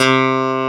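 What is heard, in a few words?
Clavinet hard C3; USB Soundscan vol.09; AKAI; Keyboards Old School; CLAVINET1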